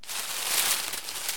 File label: toy rustle; Items, Toys